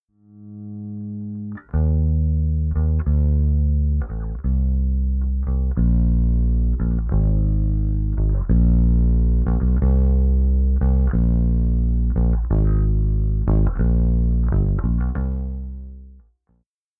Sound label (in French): basse avec tape